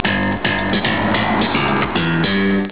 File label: Bass riff